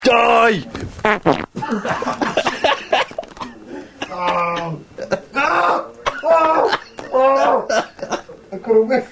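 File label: whiff, Farts & Belches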